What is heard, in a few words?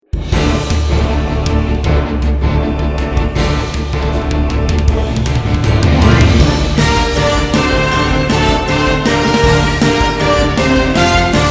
intense evil battle music